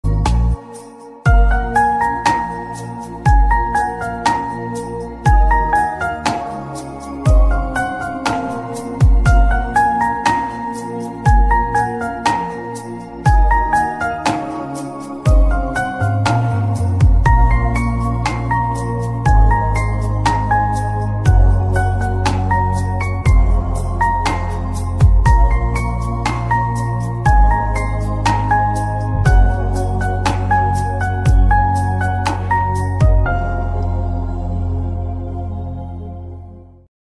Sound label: bgm ringtone remix love bgm ringtone